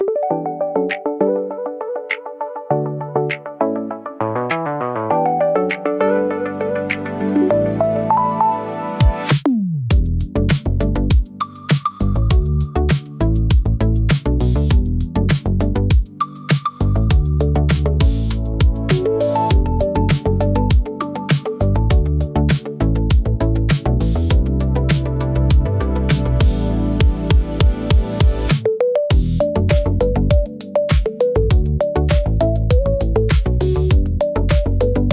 Glass Table Morning, soft electro for scruffy modern vlogs